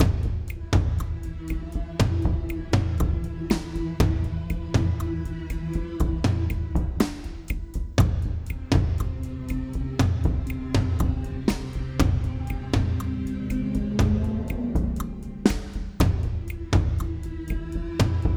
Test beat

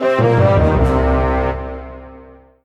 mission lost; Panda

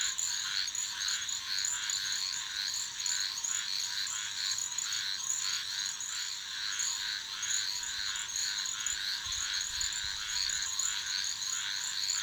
Swamp Frog; the princess and the frog

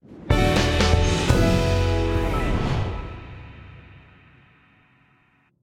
Completed; Rocksmith

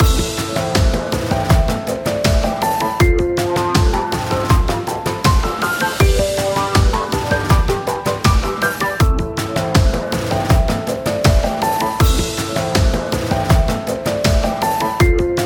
battle; Star Merc; Haurus battle

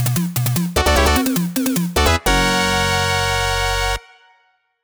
Stage Clear; Rockman 3; Megaman 3

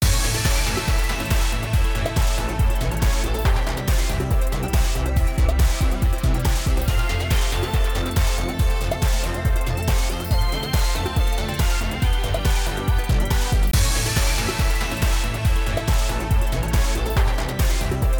Music Space Victory; lego brawls gamerip 2022

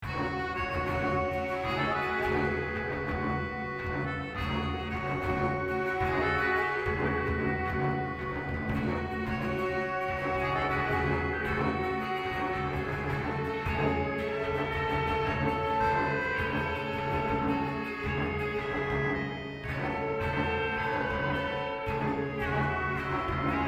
Instrumental

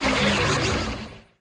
Telebird Warpout

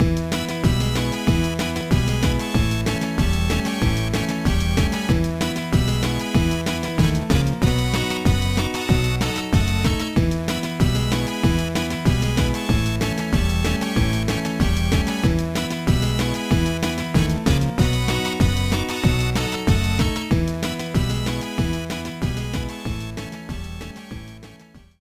BGM; Backyard Baseball 2007; GBA

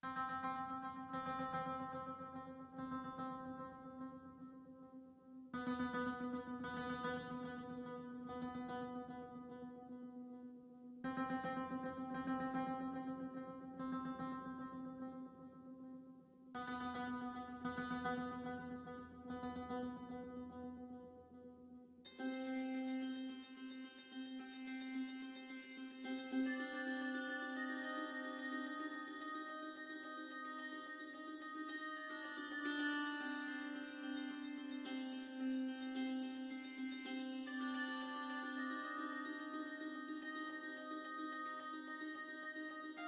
Loading up Plucks; Sniper Ghost Warrior 3

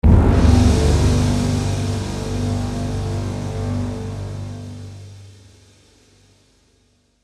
Hit

